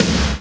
tom, 90's kit; Rhythm Section

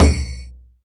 DM5 Perc, Alesis DM5 drum machine

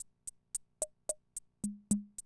CR78, DrumLoops

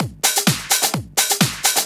Clubhouse Loop 128 BPM

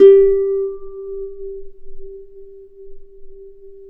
G3 HARP; USB Soundscan vol.62; AKAI

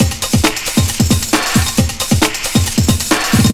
hectic funk, Deep House 135bpm

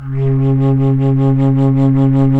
FLT, Bass Flute, BsFlt vib

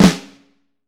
FLAM, Vintage Snare Drum; 7X14; Q Up Arts; Johnny.C's Vintage Snare Drum

